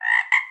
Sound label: frog; animalia